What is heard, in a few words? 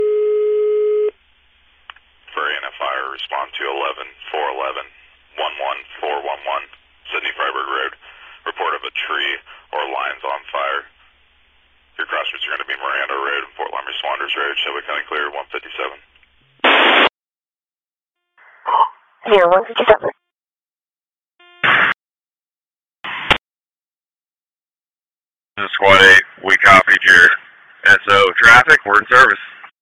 Fire, Tone Alerts; Shelby Anna; Saint Marys